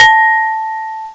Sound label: EATBOWL, BELLS; M8; CMI Factory